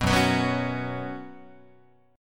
F sharp Suspended 4th, Guitar; strummed; F#sus4

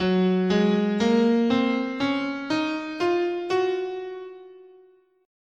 F# Lydian, scale

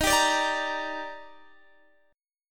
D sharp Major 7th, Guitar DADGAD

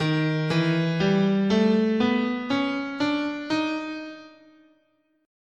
Eb Enigmatic, scale

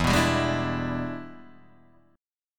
E 7th Sharp 9th Guitar Open D, chord

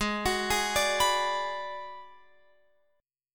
Ab Minor 7th Flat 5th, Guitar, Open F; arpeggiated; Abm7b5; x,15,18,15,17,18

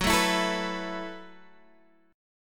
Gb Minor 11th Gb

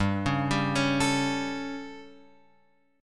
G Suspended 2nd Flat 5th G, chord; Gsus2b5 Chord {3 4 5 6 X 5} arpeggiated

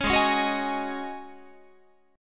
G sharp 7th Suspended 4th G sharp, Guitar; G#7sus4; strummed

